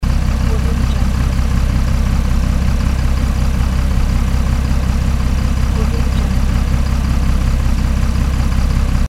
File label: bus engine